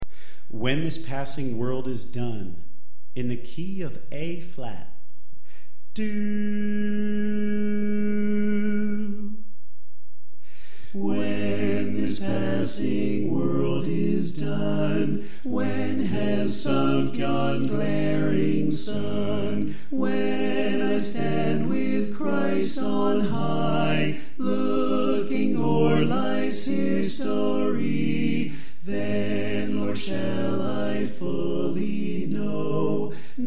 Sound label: Tenor Left